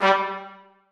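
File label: trumpets staccato g3; Sonatina Symphonic Orchestra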